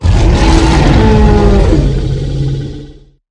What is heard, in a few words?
dragon; skytemple dragon easter egg